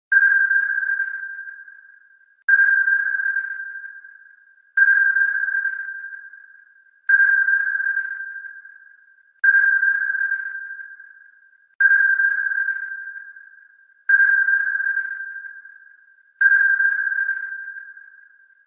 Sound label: submarine sonar ringtone